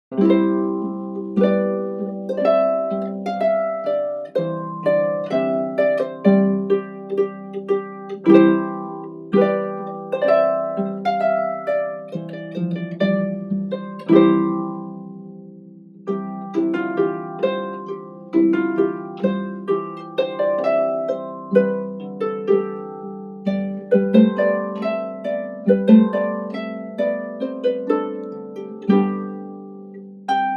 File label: Trumpet Voluntary; Purcell